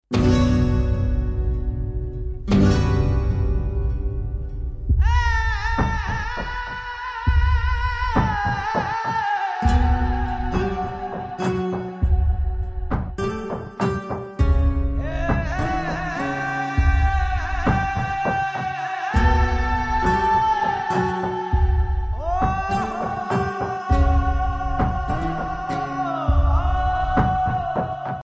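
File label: background music Ringtone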